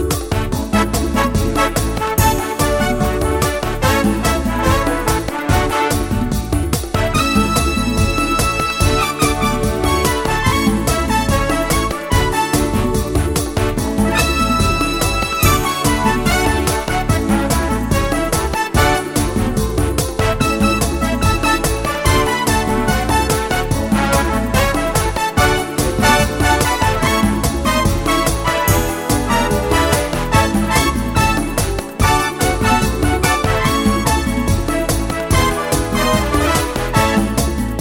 Latin Rock Hit

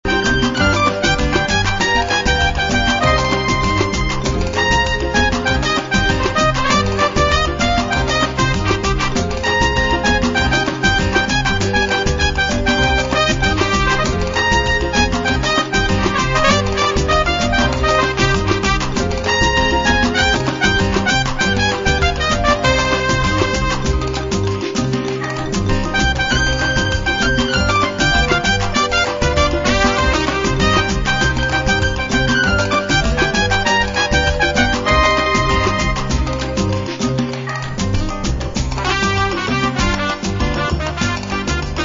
Cuban Salsa

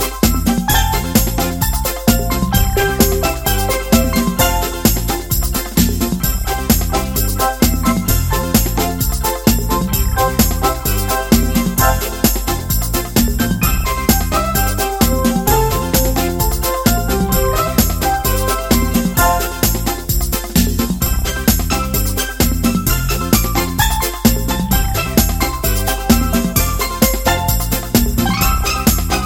Pop Ska

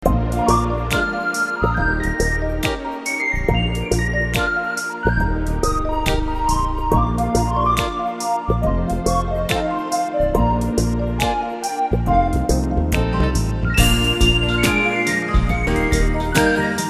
Easy Groove

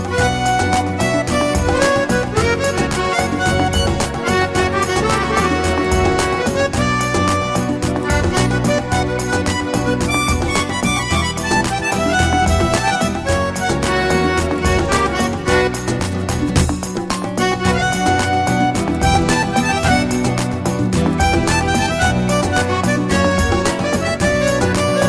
Flamenco 4 4

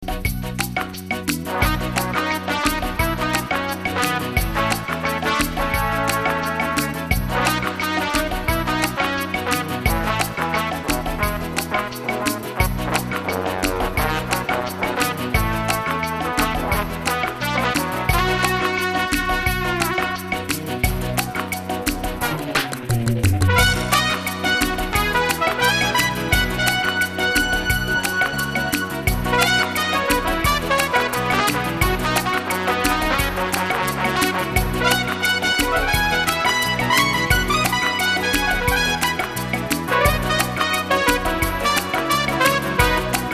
Latin Dancing; emotions

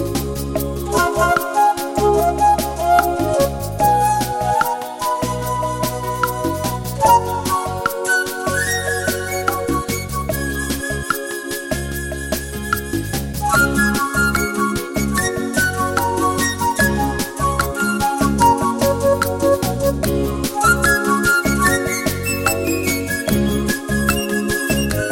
Unplugged Swing

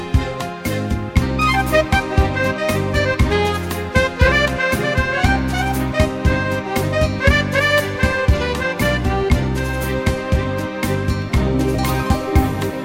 Classic Beguine

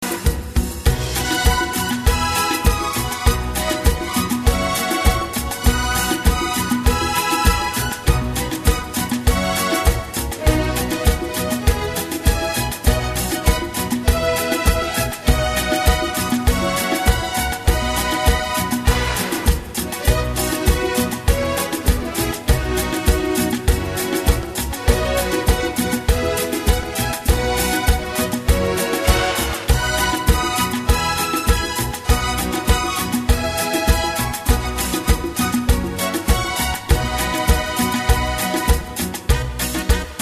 Mariachi Cumbia

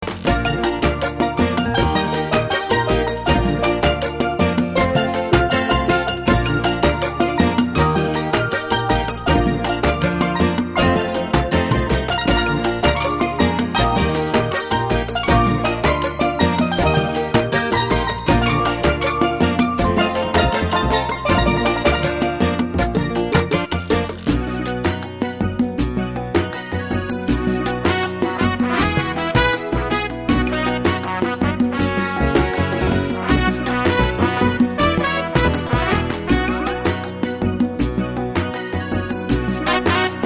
Calypso with Me

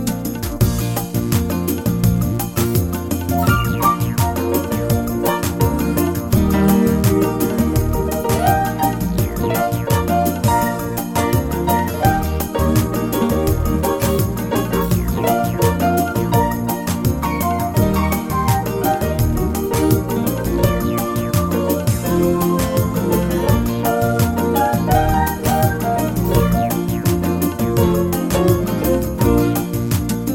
Modern Bossa Nova

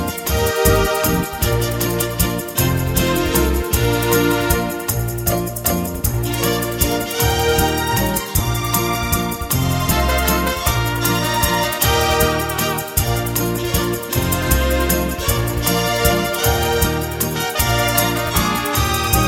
Mariachi Waltz

